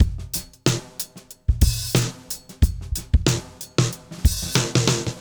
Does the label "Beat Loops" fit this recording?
Yes